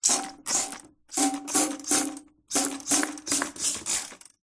household spray bottle spray cleaning solution into metal sink; zapsplat